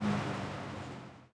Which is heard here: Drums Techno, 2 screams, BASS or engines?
engines